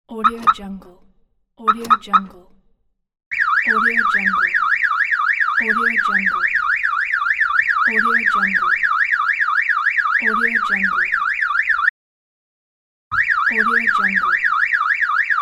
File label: car alarm